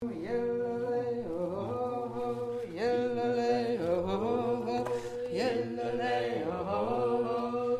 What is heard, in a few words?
Bass